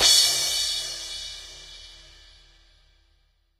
soft hit finish; osu!

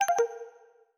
recording stop; steam; steamui

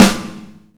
soft hitclap; osu!

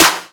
soft hitclap; osu!

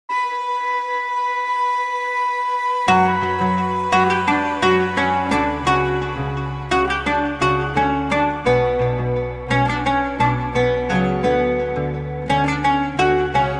Oud, Arabic Version

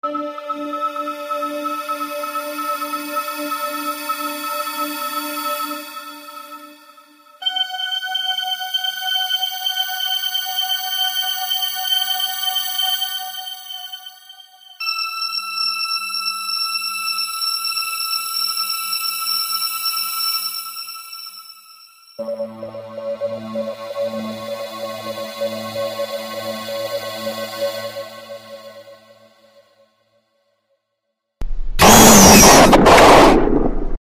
very scary

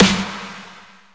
normal hitclap; osu!

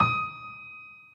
normal hit whistle; osu!

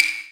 soft hitwhistle; osu!

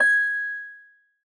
soft hit whistle; osu!

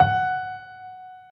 soft hit whistle; osu!

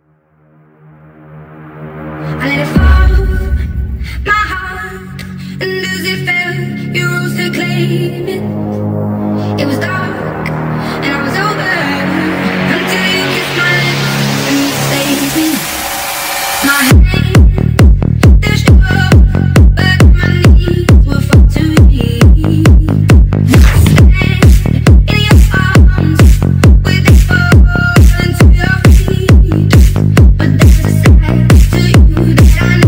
Bouncy, Bass Boosted

